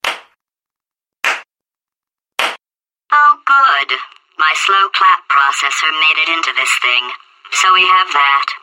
slow clap

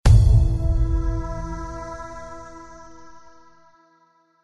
deep chill tail

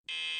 light sound; fnaf

